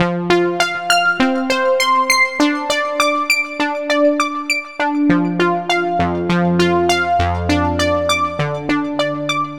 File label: Synth, Trip-Hop Loops